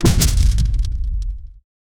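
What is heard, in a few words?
titanic stomp earth shaking, earthquake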